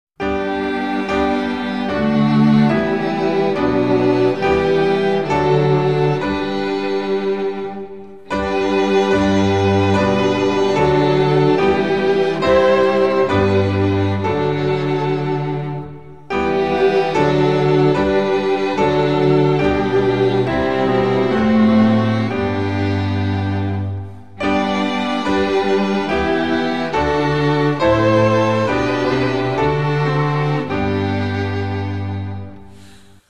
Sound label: vocal and instrumental, hymns spanish